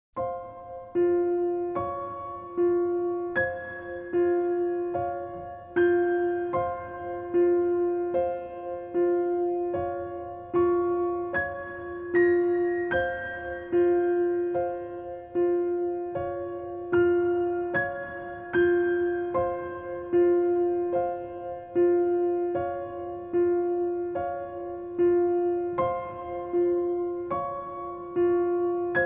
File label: piano